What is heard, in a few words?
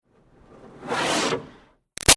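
Water Spray Interior Car Grill Fast; Car Wash; Spraying; Objects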